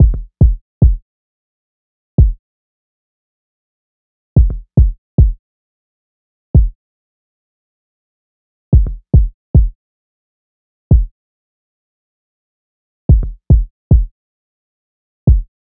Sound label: Drum Loop, 110 BPM Kick; Cymatics; Vibes II Drum Loop Collection; Avenue